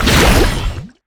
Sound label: hydroblast; nami base